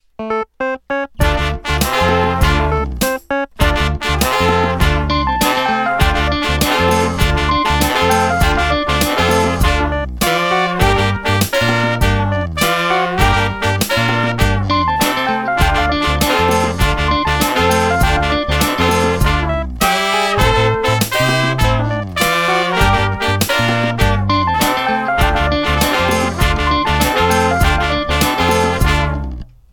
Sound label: Funky Horn Party